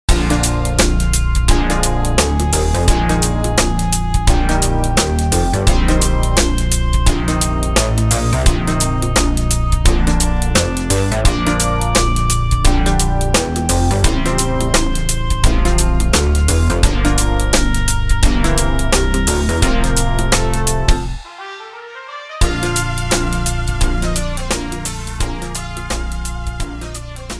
concerto in g minor 2nd movement fragments; bwv 1058; bach

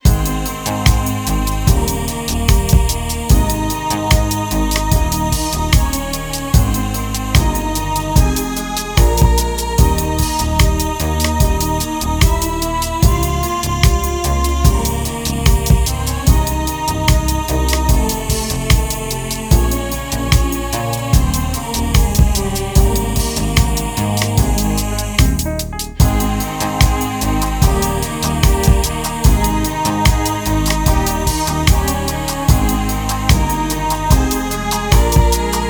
piano sonata no8 2nd m pathetique; beethoven

